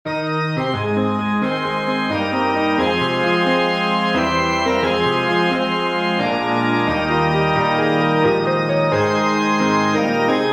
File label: with piano and organ; The Star-Spangled Banner